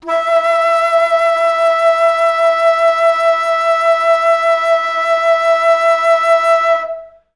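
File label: alto flutes sustain E4; Best Service; Peter Siedlaczek's Advanced Orchestra; AFS LONG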